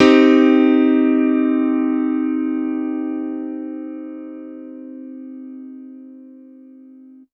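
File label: Piano Electric minor; TrashLibrary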